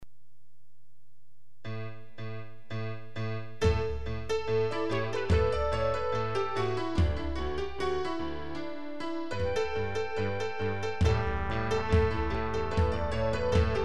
percussive; percussive plan

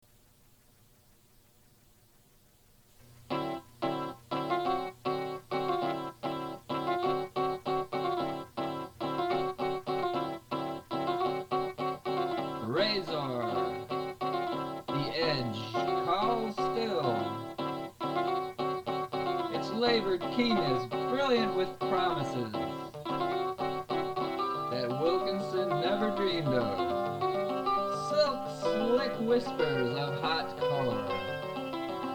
chorus

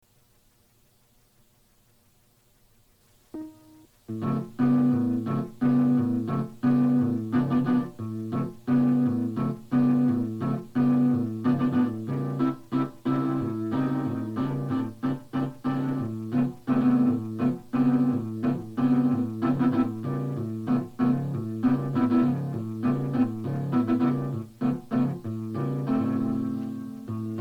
end b chorus

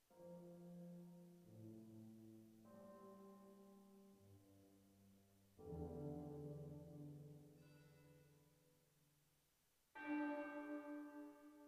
horror raw tower bells; juno g

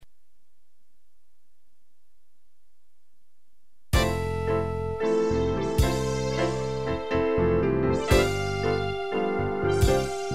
with analog pad

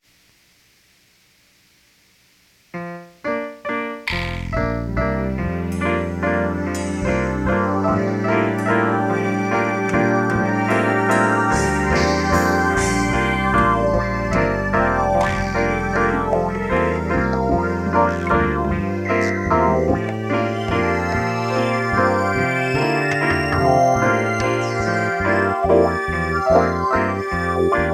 computer variations

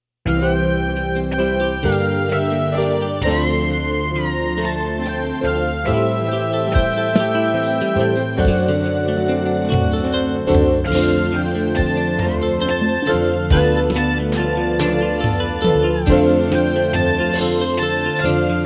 drums

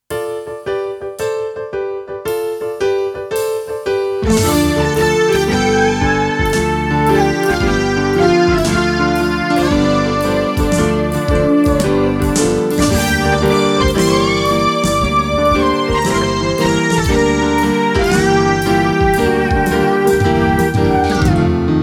theme song inst

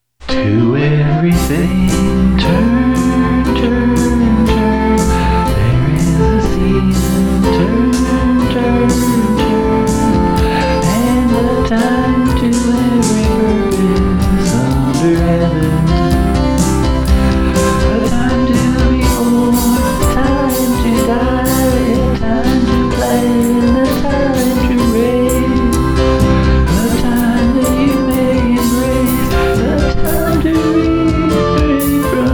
sing

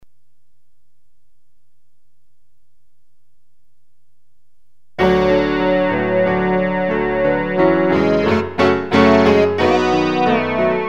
Piano And Guitar

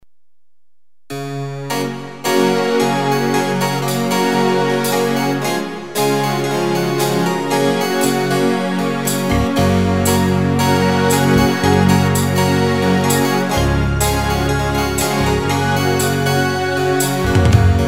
orch

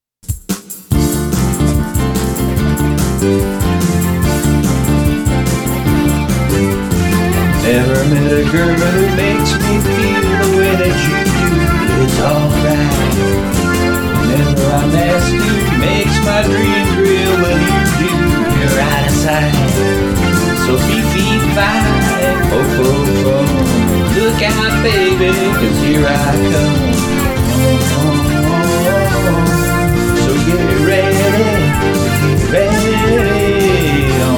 sing

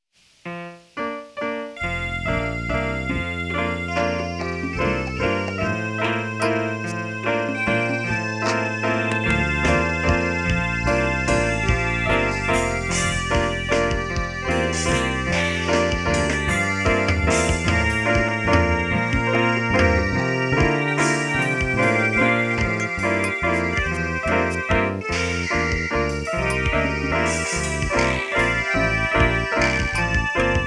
computer variations

